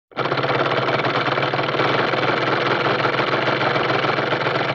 Comedy Motor, Vintage Cartoon Foley

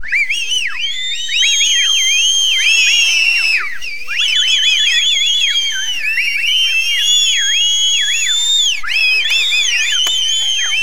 Whistles Crowd

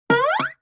Guitar Plink Slide; Vintage Cartoon; Musical Elements

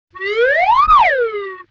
Slide Whistle Up Down; Vintage Cartoon; Musical Elements

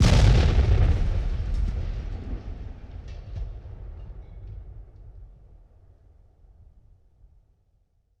WWII Explosion Single; Period Combat

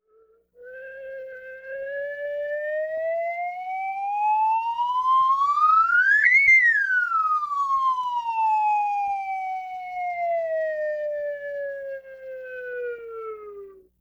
Slide Whistle Long; Vintage Cartoon; Musical Elements

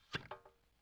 Plunger Ploink Metal; Vintage Cartoon; Foley

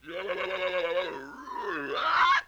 LoFi Synthetic Squawk

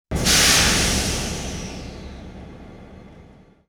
Steam Train Release Steam; Period Vehicles

